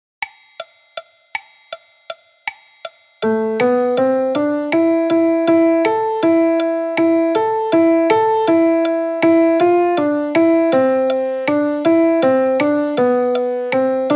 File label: Piano Version